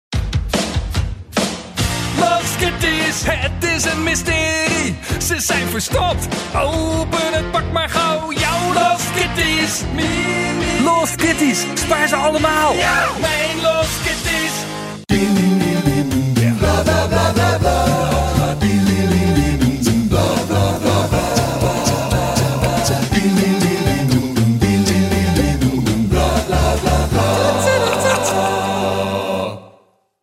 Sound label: dutch voice singing